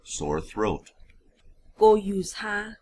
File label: sore throat